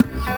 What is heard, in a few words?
C STAB; funky; Loopmasters; Funky Underground Vol 2